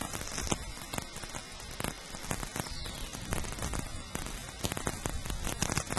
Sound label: VLF